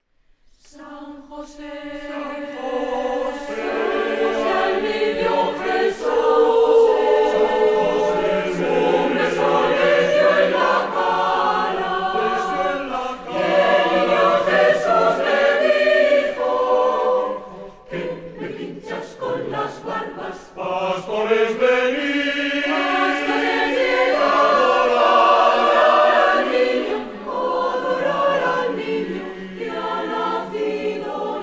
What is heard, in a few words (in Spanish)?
coro; 6a Bienal Coro Mixto Pena Santa; santa cecilia